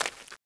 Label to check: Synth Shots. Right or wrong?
Wrong